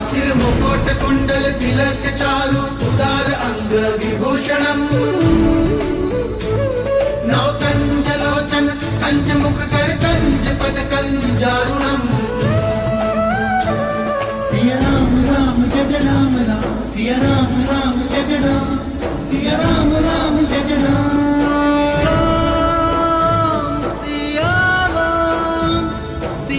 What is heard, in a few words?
ringtone